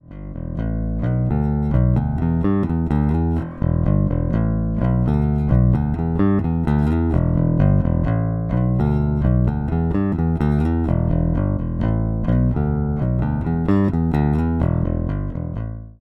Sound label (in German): MB 4 SBK Deluxe Series Hintere Spule Plektrum; Harley Benton